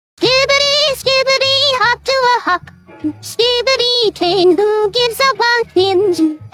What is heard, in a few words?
kid singing christmas song